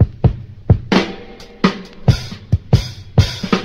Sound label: too many hits, drum loops, funky